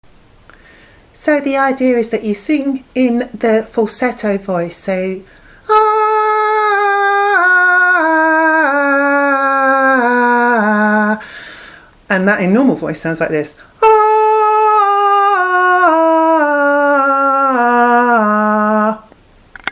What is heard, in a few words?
female falsetto singing